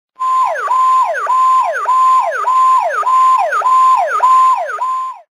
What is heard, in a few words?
power call